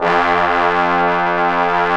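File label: BRS BONES, Orchestral Ensemble; Roland L-CDX-03 Disk 2; Orch.Ensembl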